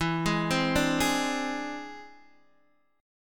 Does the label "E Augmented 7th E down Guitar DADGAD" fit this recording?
Yes